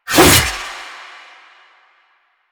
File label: Sickle Whoosh